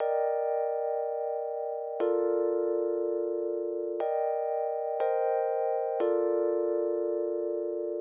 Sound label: 120 rhodes, Keys, Nu Jazz House; PowerFX